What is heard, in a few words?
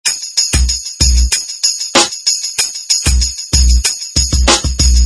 Drum Loop 95